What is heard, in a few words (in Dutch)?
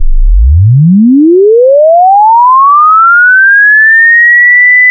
20-2000hz lineair; testsignalen